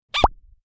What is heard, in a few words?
Cartoon idea pop